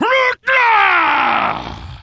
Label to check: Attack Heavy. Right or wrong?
Right